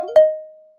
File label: boot complete